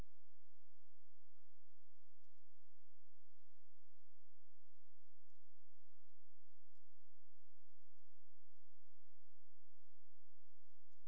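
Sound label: hydrophone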